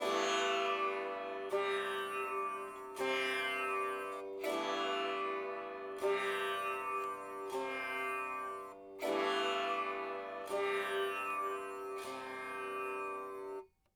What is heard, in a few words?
sitar